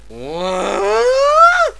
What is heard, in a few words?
whaa